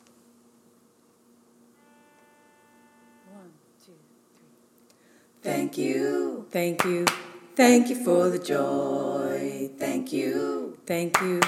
bass; The Freedom Choir

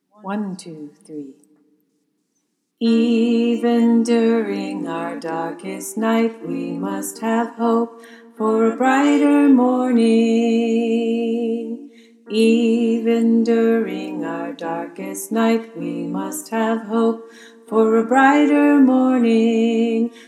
Tenor, choir; freedom choir

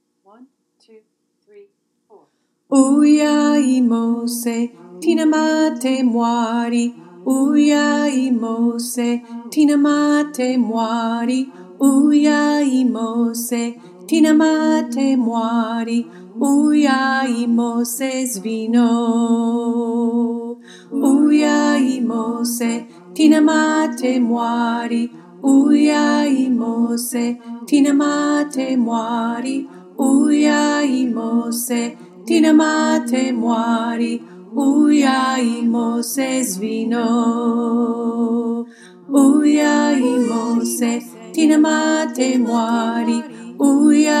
Alto lead

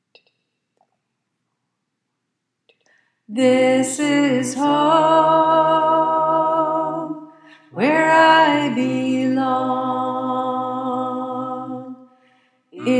Alto, choir

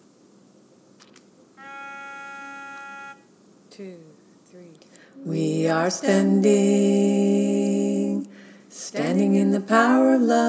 Female tenors; choir; The Freedom Choir

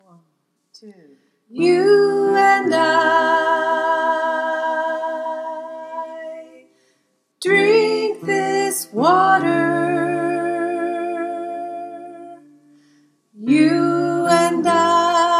Soprano, choir

